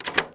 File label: Switch